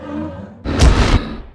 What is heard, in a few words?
Attack Leg